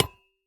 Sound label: Metal Hit